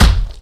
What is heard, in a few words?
kick